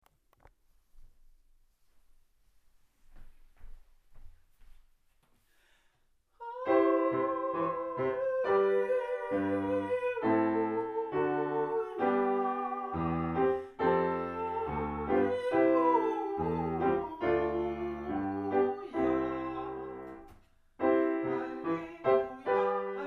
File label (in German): Halleluja